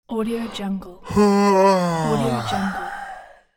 yawn

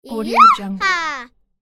little girl wild joy scream

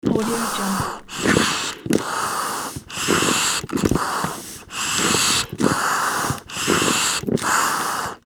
large bellows slow heavy pumping

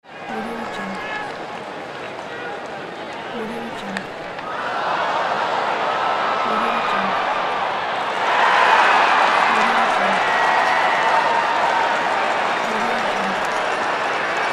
sport fan in stadium

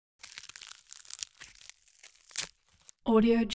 wood tear ripping bursts sharp crackling

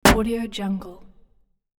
metal puncture clank; hollywoodedge

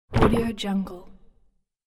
jelly liquid shake; fxprosound

